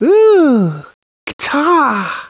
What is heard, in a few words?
Sound guitar